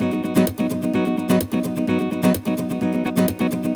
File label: Nylon Guitar B maj, 128BPM; Vengeance Samples; VEH3 Nylon Guitar Kit 1